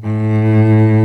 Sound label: A1 CELLO; InVision Interactive; Lightware VOL-1